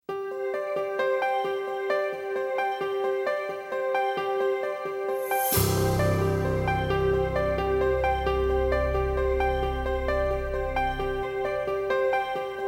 New Age

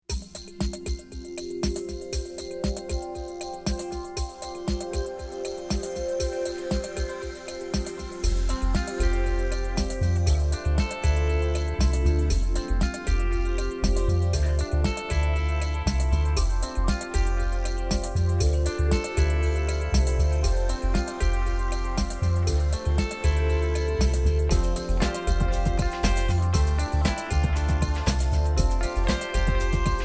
Space Groove

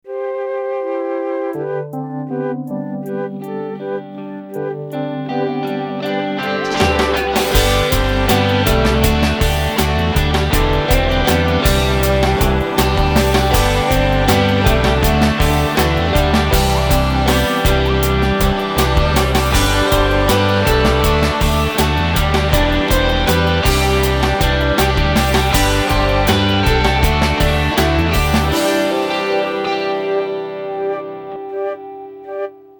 Alt Rock

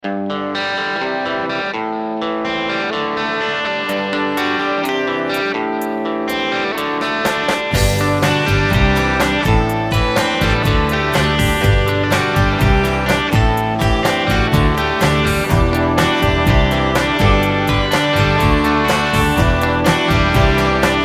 Alt Rock

